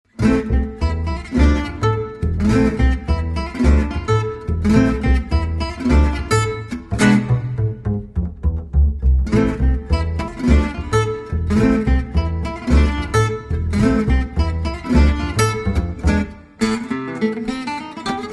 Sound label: trio